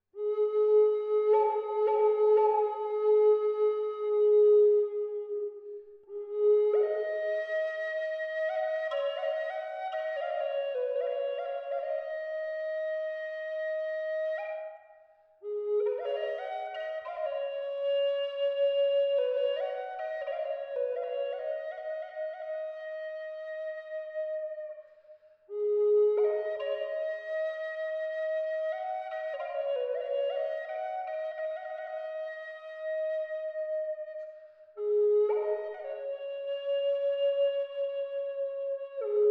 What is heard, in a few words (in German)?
Eibe A 432 Hz Chalcedon Ebenholz